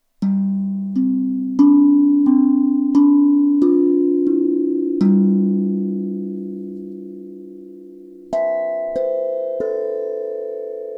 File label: Sennheiser MD445 Aquadrum